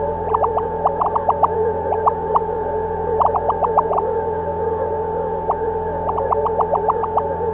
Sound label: alien blipper, ambience; Half Life Model Pack; Half Life 2; hl1